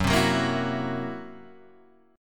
Gb 7th Flat 5th Guitar, chord; strummed; JGuitar

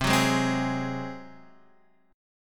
Ab 7th C

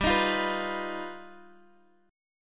G Augmented 7th, Guitar, Half Step Down

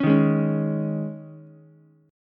Eb 7th Suspended 4th Eb, Ukulele; strummed; chord

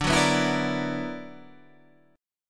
B Minor Major 11th D, chord

